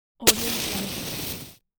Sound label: match strike; wistansound